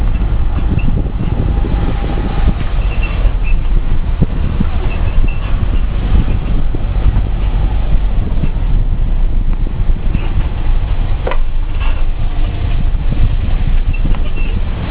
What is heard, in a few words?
rear noise